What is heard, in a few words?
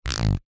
industrial ratchet plastic turn clicks